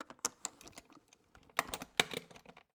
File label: Cabin, window, latch, flip, lock, push, loosen, clatter, hyper; Eiravaein Works; Vaeyan IV